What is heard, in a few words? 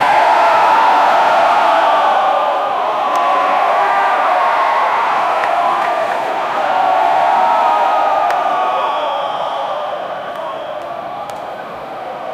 crowd cheering at soccer; stadium